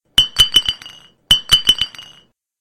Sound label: falling mate short